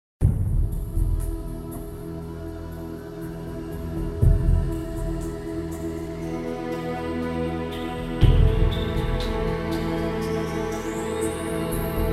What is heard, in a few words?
Bass